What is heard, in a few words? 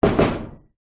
household knocking metal garage door